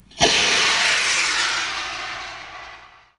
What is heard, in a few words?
rocket, fire